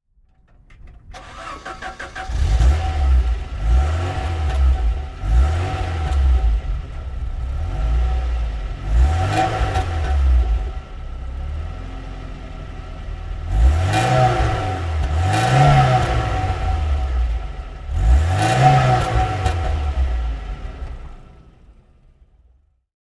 Saab 900i Cabriolet 1990

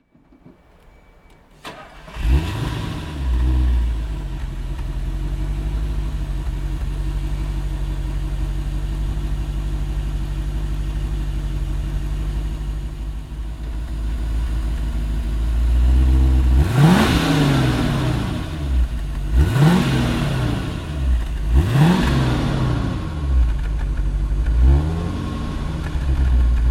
Mercedes 350SL 1971

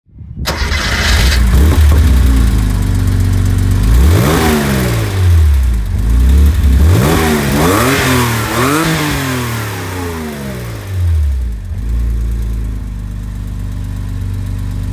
Porsche 911 Speedster

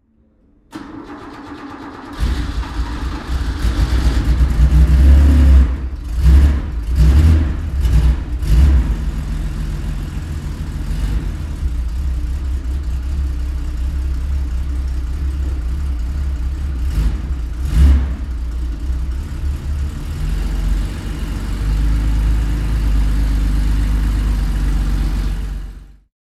Porsche Carrera RSR 3.0

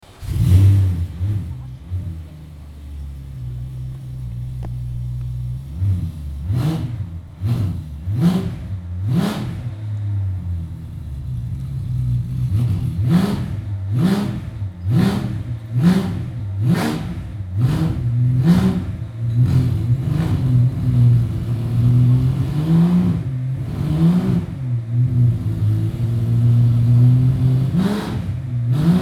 Porsche 911 GT3 996 Rallye; Porsche Sound Night

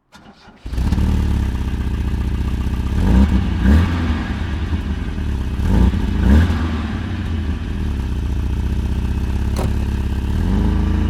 Opel Manta

